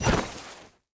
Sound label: Dash; bloom minigame